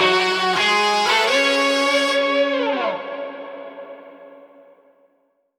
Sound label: mega win end; netent